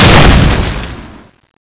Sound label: lock on gun